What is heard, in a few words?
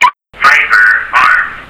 alarm armed; viper